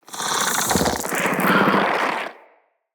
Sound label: Spider Elite Vocal Chatter; Root Spider Elite